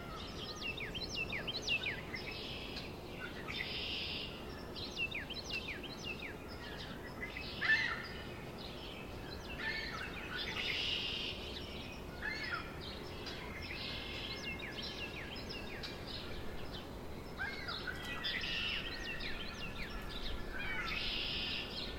Carolina Wren And Then Robin with Red Winged Blackbirds Gulls And House Sparrows